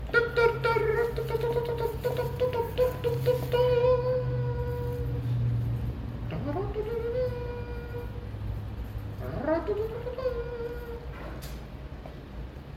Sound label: beats